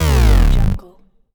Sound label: bass slide down